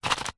staples box heavy duty short shake; office

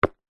foley footstep single football boot on concrete

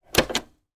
household hi fi system cassette tape deck open

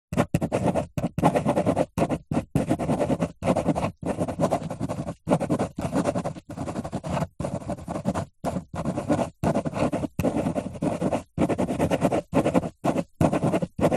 office pen ballpoint paper hollow table writing; zapsplat

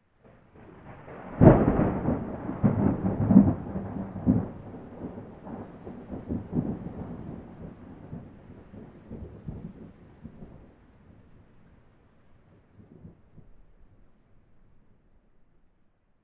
nature thunder

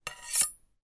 household knives large scrape together

